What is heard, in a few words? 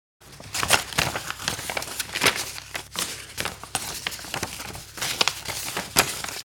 Paper handling unfolding